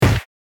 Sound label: punch